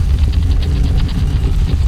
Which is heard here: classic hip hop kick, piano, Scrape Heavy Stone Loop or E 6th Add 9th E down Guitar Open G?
Scrape Heavy Stone Loop